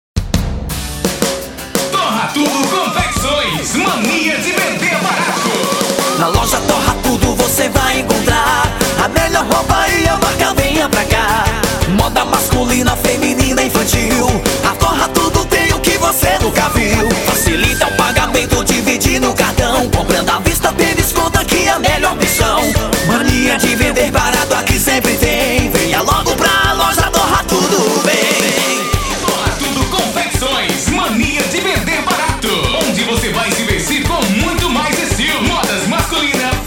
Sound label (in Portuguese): jingle loja; torra tudo